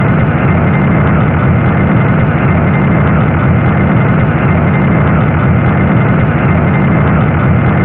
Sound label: mill engine